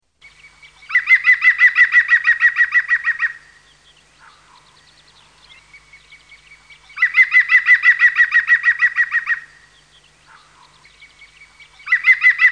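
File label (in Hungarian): Zöld küllő; Madarak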